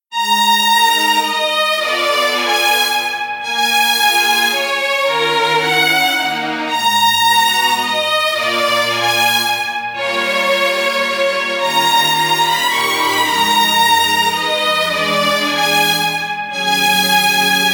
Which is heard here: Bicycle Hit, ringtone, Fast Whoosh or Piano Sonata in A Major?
ringtone